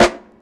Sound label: short steel snare drum sample f key; snare drum samples